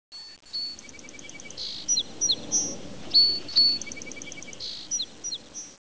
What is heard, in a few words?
sparrow; OpenBVE; Railway; Blueridge